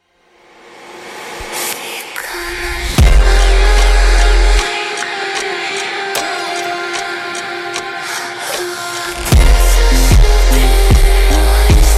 Funk slowed, funk

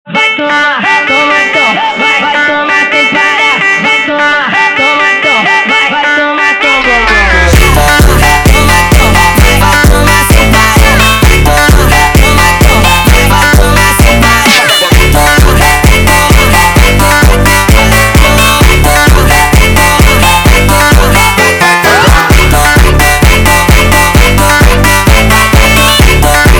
Funk

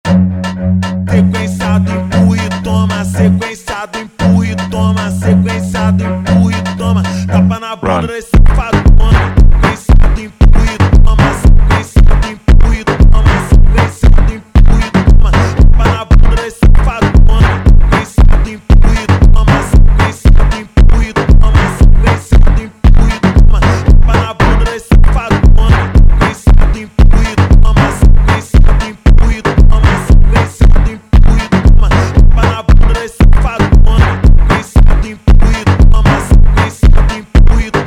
Funk slowed

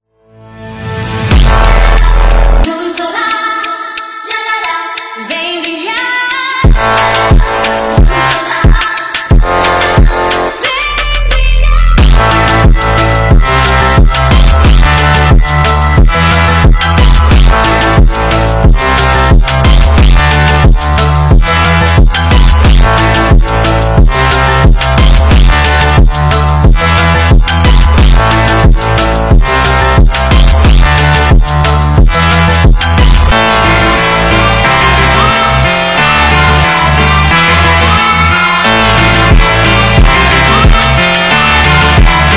Funk, Sped Up

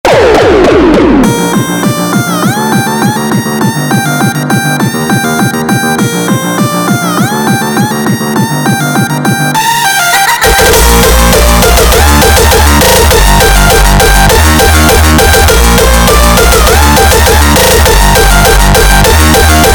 Hardstyle sped Up

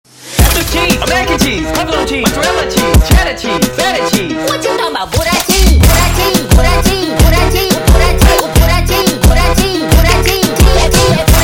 Brega sped Up; funk

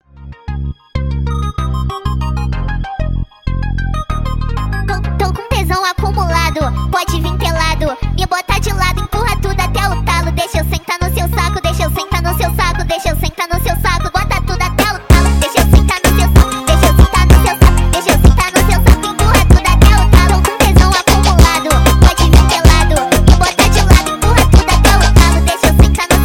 Brega sped Up, funk

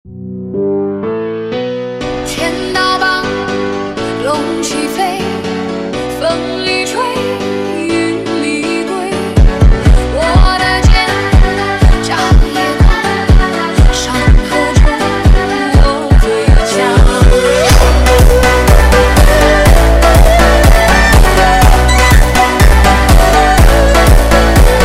slowed, funk

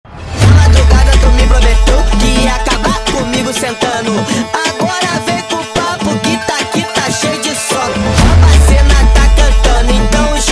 sped Up, funk

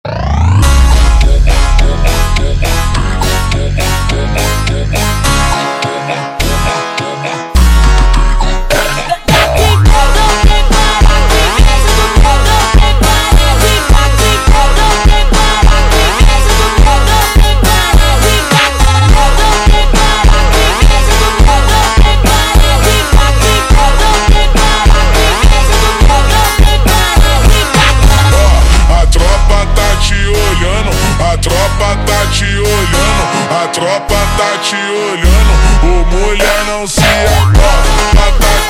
Funk super Slowed, funk

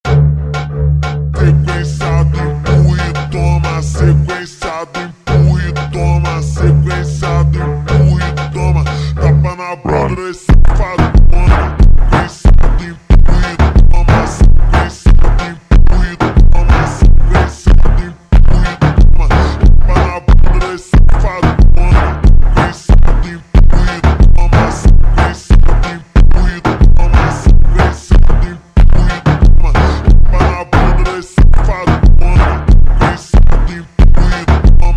Funk ultra Slowed

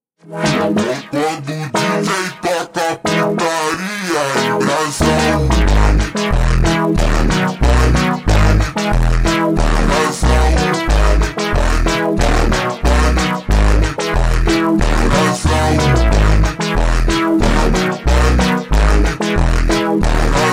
Montagem ultra Slowed

